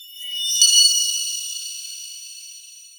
flow whoosh

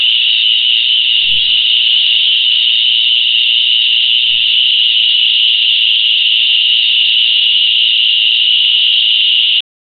airport fire alarm; dubai

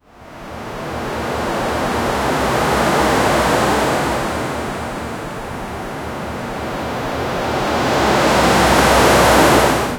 sound waves to attract, retail

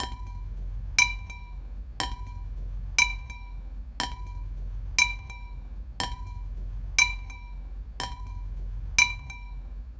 atmospheric rain falling, rain on glass; SFX Engine

